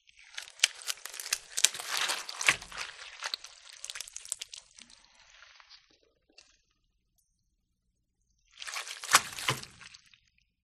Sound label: Brain Squish Effect; audio hero